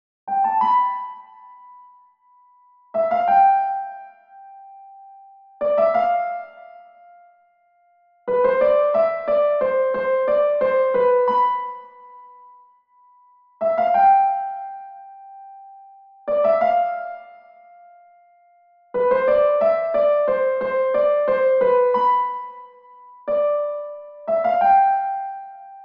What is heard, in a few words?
PVBGM; 教室で二人